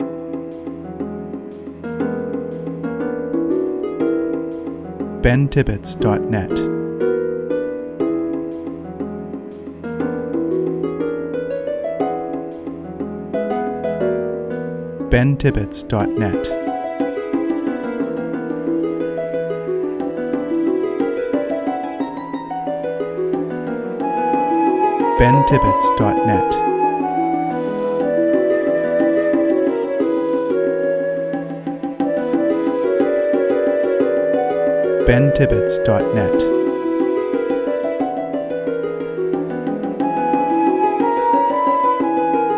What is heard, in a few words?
Magical Breeze